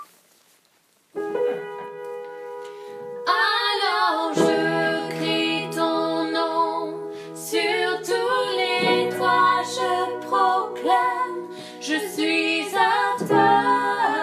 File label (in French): Sur Tous Mes Toits Voix Mezzo